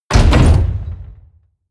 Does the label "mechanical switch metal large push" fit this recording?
Yes